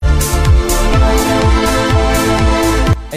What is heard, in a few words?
volm of strings